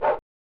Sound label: 'Bark!'; Mickey Mania The Timeless Adventures of Mickey Mouse SNES